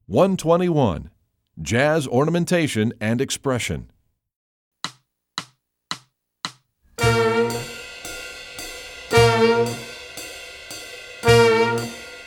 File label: jazz ornamentation expre